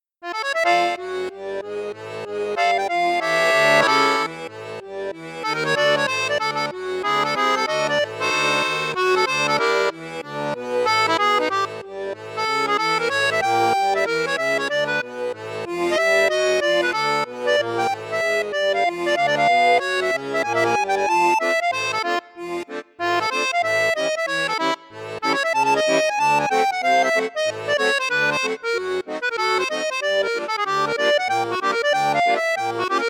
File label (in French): registre flute juste, accordeon; hohner morino artiste vi d